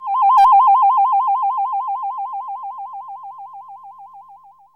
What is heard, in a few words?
TRILL C, SYNTH FX; AMG - Global Trance Mission VOL-2